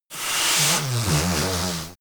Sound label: yeti nose blow strong